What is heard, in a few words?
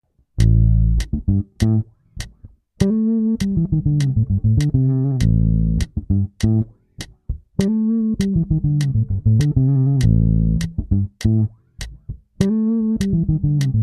Blues scale fill in A